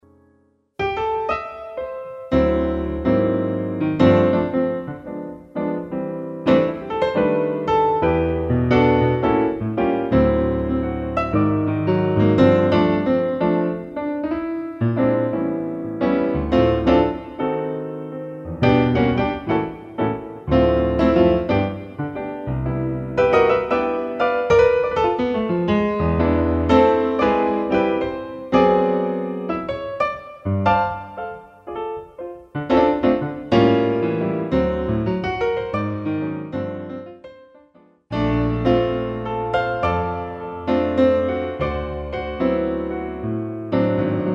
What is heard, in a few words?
COCKTAIL PIANO